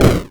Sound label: hit